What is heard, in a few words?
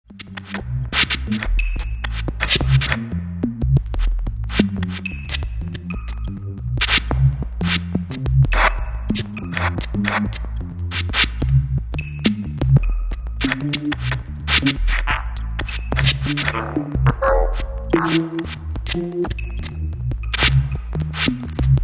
Circulation pur electro